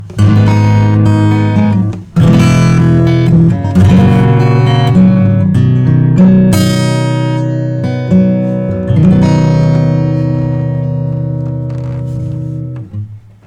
acoustic DRIVE 100; Joe Chiccarelli

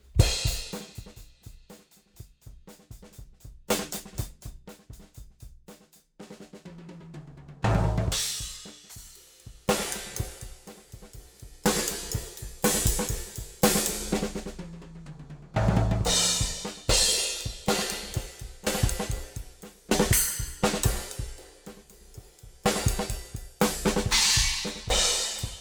GATE HPF 800Hz High Q; AMEK 9099

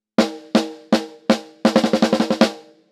High pass 320Hz Low pass 8kHz; Sugar

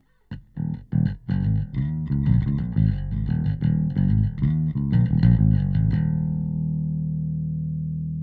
bass bypass

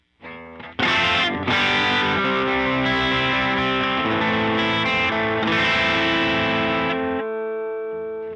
Electric Guitar; Bus Processor

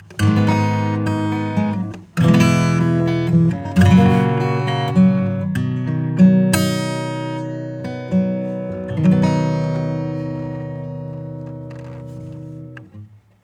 RESONANCE TONE BRIGHT

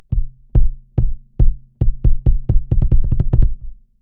808 kick SUB 100; SubBass Doctor

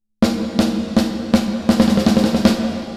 Reverb diffusion high

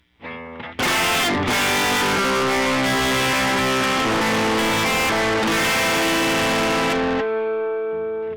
guitar SMOOTH SATURATION; SKYE Clipper

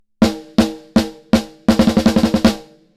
snare default

